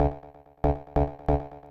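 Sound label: synth electro 140 A; Techno; Keys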